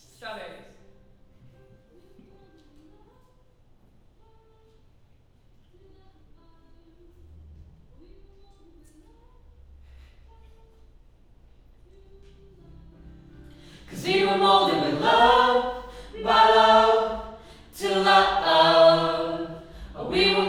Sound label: Choir L